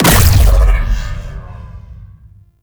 Rail Jack Weapon; Warframe; RailJack Weapons; Modular Weapons

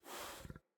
Pet Snort; Enemies; Catbrow; Pet Ship

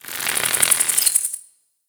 Winged Insect Passby; Ambience; Warframe; The New War; Narmer Outpost

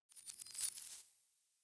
Shrine Puzzle Correct Unlock

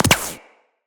Shield Impact; Tenno

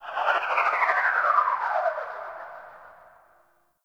Gas City distant wind textures; Warframe; Corpus Gas City; Ambience

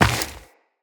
Run Dirt, Footsteps; Tenno

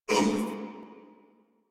Pain Crewmen, Battle Barks; Dialog; Warframe; Corpus Crewmen; Crewman C

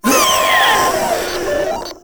Proto Infested Runner Death; Warframe

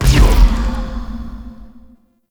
Corruption UI Debuff; Warframe; Missions; Zariman

